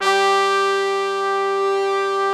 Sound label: BRASS G3, ORCH BRASS; Greytsounds VOL-1